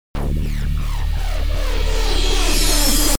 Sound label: Boss Battle Theme - Intro; Cyclonus